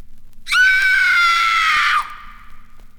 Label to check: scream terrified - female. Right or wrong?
Right